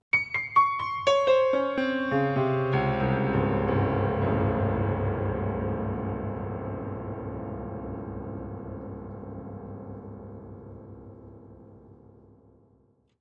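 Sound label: Grand Piano Scary Descending; keyboards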